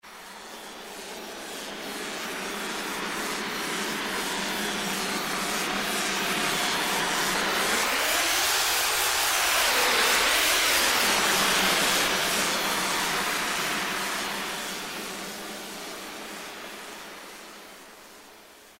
Flangehead; Roland M-DC1

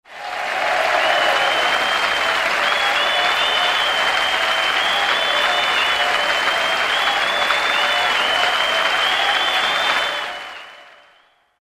Crowd; Roland SR-JV80-09 Session

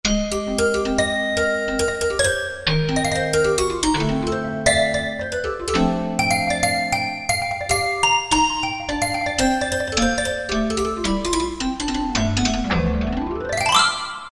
Toy Piano, Korg T3

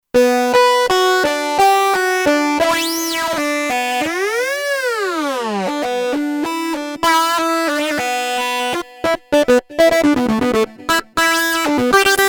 Hammeron Synth, Kurzweil K2500X; Factory Programs

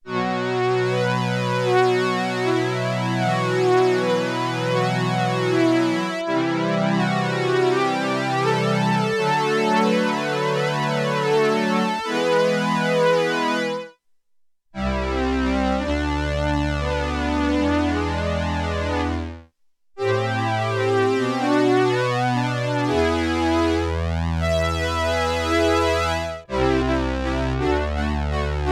STRINGS; Factory

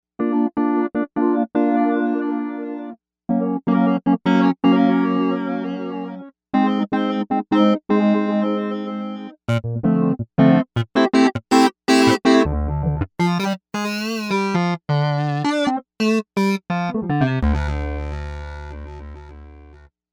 Daft Robot, Novation UltraNova; Factory Patches

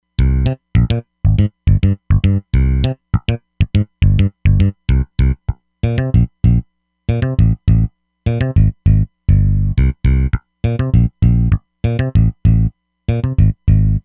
Snug Bass; Roland Fantom XR

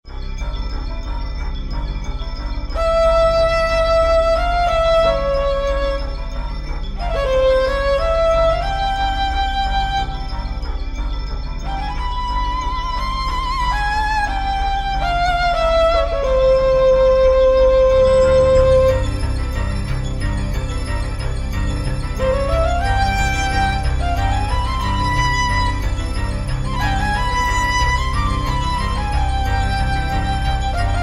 Harem Dance, Roland V-Synth

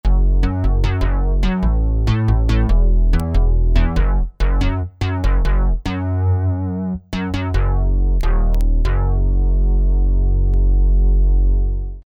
Crow Bass

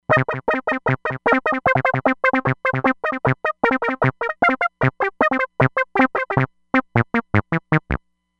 Reso Seq Saw

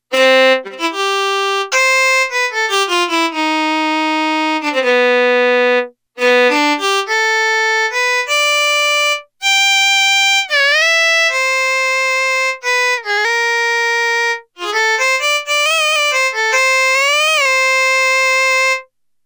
Fiddle

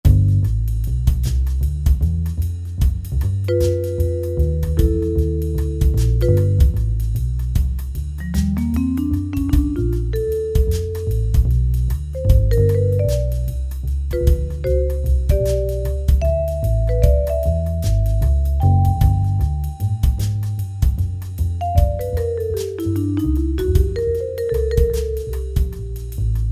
Bass Vibe

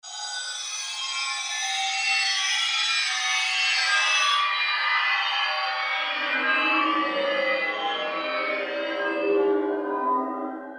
Pan Effects

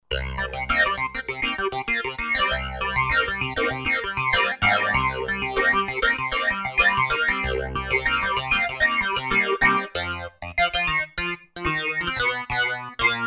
Phaze Clav; Roland JV-2080

